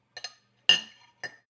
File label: cutlery on plate clatter and scrape, gastronomie; berufe; soundpool